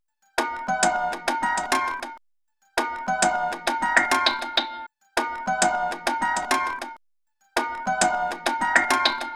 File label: Toy Piano Orbit; Electronica; Sequences